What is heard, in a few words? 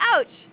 ouch